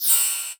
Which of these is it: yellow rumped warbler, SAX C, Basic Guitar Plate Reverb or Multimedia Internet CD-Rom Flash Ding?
Multimedia Internet CD-Rom Flash Ding